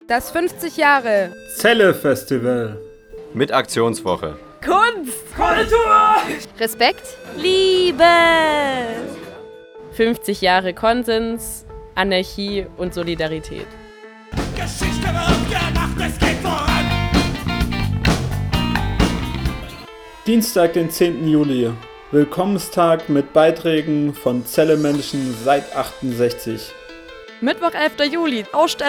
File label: festival JINGLE; 50jahre ZELLE